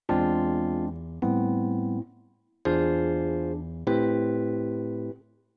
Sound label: minor V7